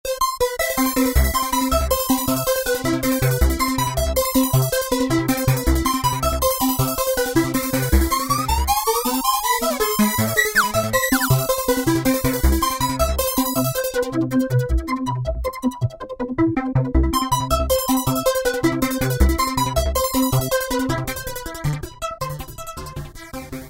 Roland V-Synth; Tangy Speed; Factory Patches

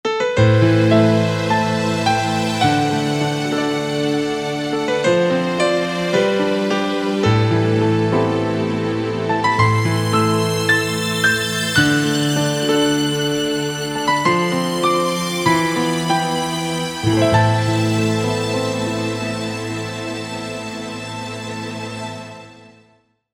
Piano Str

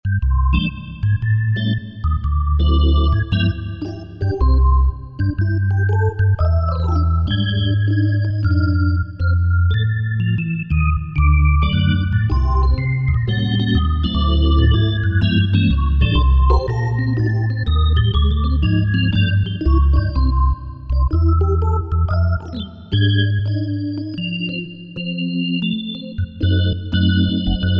Jazz Organ, Korg TR-Rack

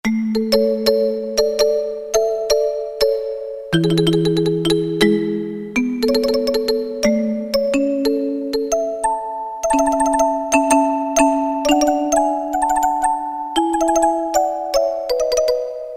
Marimba

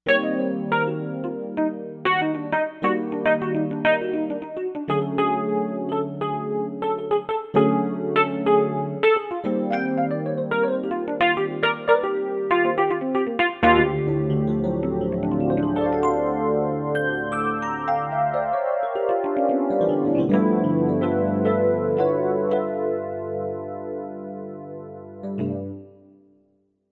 Jazz Chorus

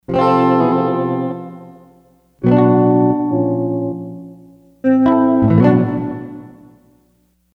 2 Part Verb; Sony HR-GP5

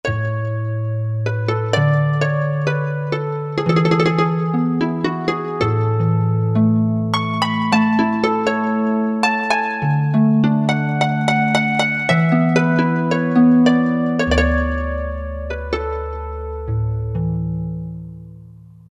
Harmo Harpo, Roland JD-990; Factory Patches

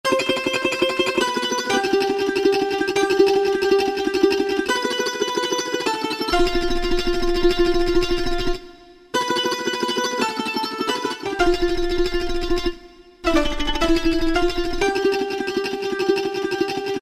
Mando Trem, Alesis QS8; Factory Preset examples

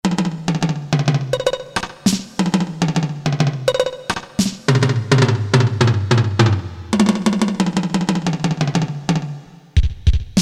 ROOM DDL, Percussion, Korg DSS-1; Percussion II

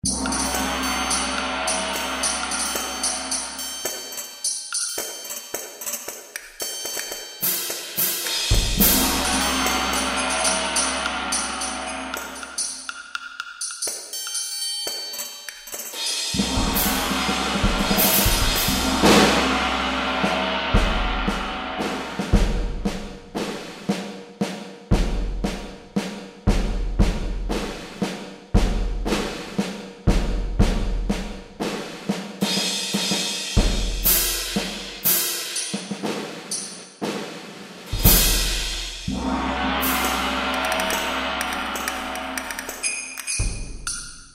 Orchestral Percussion; Kurzweil K2000 Orchestral ROM

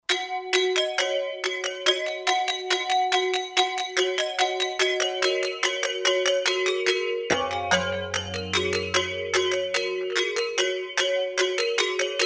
Pemade; Roland SR-JV80-14 World Collection Asia

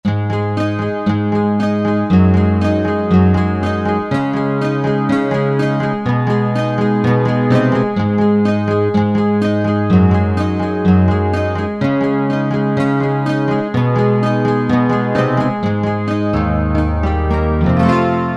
12-String Guitar, Korg Wavestation A-D

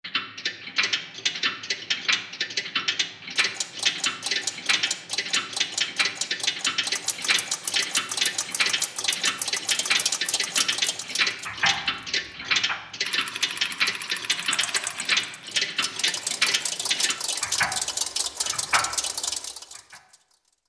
Clockshop, Korg MSC-8S Percussion

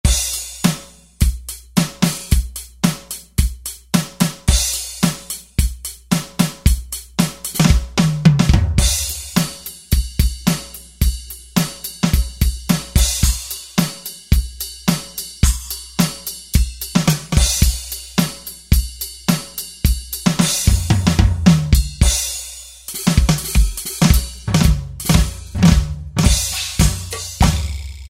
Real Rock, Alesis QS8; Factory Preset examples

